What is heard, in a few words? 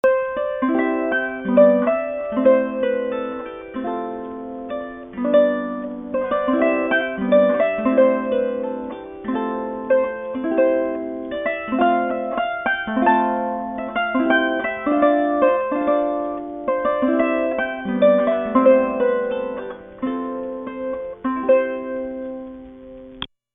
The Shearin Not For You